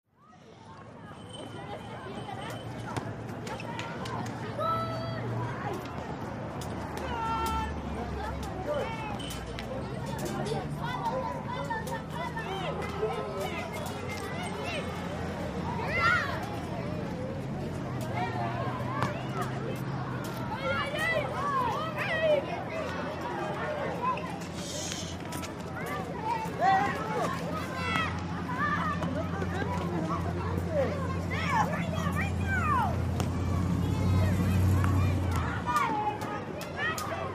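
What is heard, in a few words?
City Playground Ambience, kids play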